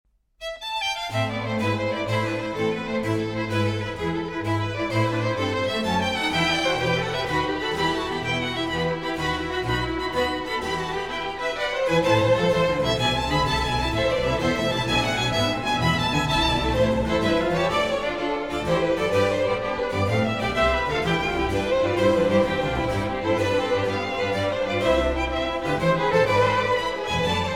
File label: concerto for violin strings and continuo in a minor; bwv 1041